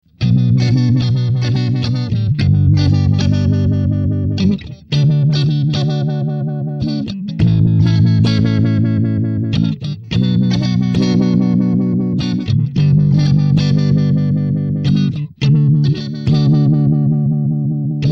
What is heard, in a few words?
space phase less lie